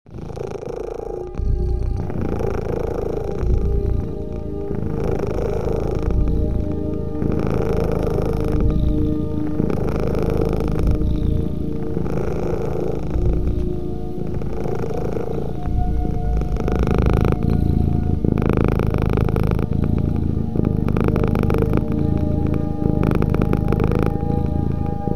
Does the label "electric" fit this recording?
No